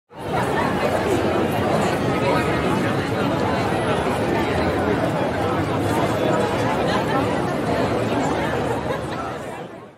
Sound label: crowd talking